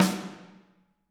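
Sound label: snare drum, Drums; Bob Clearmountain Drums I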